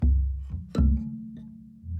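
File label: Calm Far, music; From Dust; ps3; xbox 360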